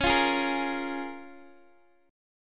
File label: C sharp 6th C sharp down Guitar Open D